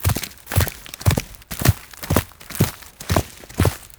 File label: Horse Steps Gallop Loop Vegetation; Warframe; Duviri Horse; Vehicles